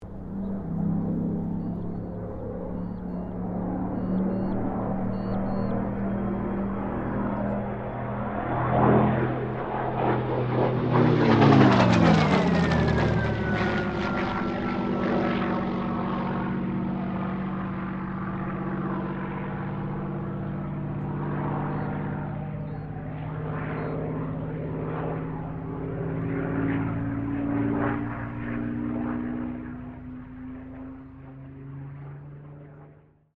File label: AIRCRAFT SUPERMARINE SPITFIRE MK-XVI PASS